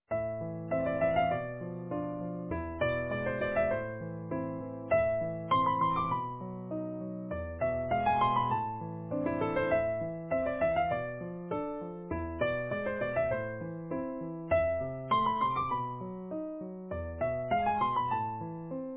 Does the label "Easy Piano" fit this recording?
Yes